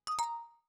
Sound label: Windows Notify; Pearl